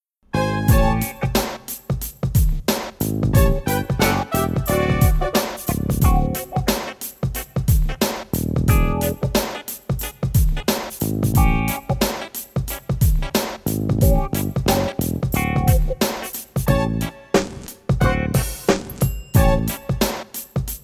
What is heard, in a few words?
Anger Got Ahold of Me Instrumental